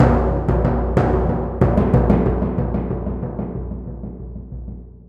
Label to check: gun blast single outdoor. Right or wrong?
Wrong